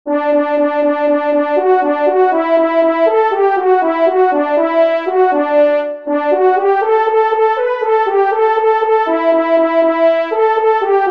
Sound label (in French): Renard Solo, trompes; Fanfares imposables en Concours